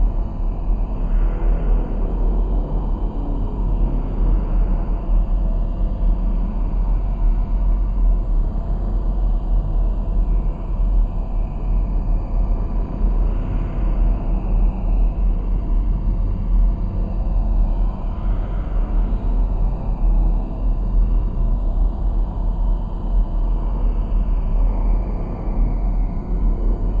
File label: ship titan idle; Stellaris; shroud; ships